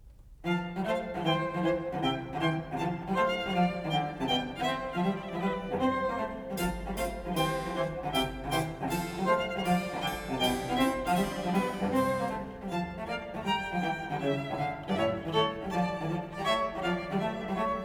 Trio Sonata For 2 Violins And Basso Continuo No.5 in F major; Vivaldi